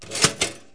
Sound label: Toaster Push Down; Household; Kitchen; KABOOM! - Wild and Wacky Sound Software